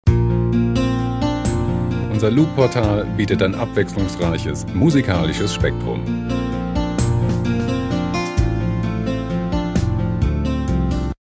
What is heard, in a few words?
guitar ballad